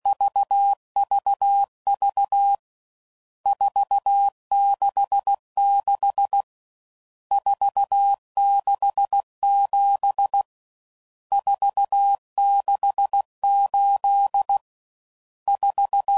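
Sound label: Various RST Numbers, CW Code Groups 15WPM; Morse Code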